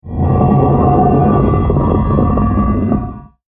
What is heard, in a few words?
Pantomime Secret Cave Door; Cartoon Sound Design Sound Effects